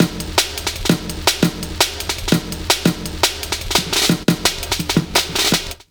LOOP, Explosive Jungle

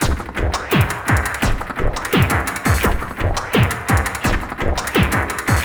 170 u bend, Drum Loops; Total Drum&Bass

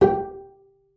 G4, bass pizz; Xsample VOL-5

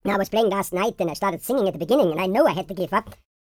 YODEL YELL, World Voices

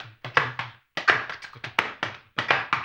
HAMBONE, Vocal; Blues & Soul

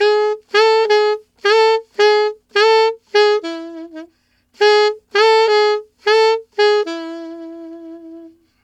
Alto Latin in A maj, Sax; Sonic Foundry (Sony Creative Software)

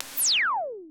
zap, Roland MC-909

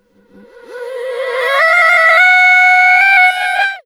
REVERS FX, REVERSE FX, Funky Vocals

